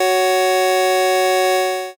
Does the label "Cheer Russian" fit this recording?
No